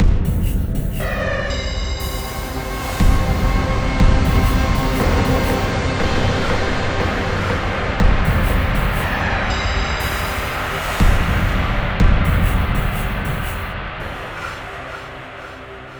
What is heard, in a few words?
Danger Tonal; Insanely Twisted Shadow Planet